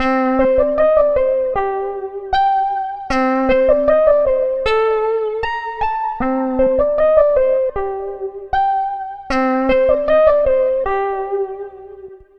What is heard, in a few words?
Guitar Based; Guitars; Eclectic Electronica